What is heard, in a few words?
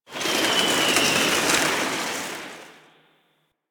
Destruction Space Wind; Ambience; Warframe; Corpus Railjack